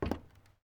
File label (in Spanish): Paso zapatill madera; yesterday origins gamerip 2016 switch ps4 pc ios macos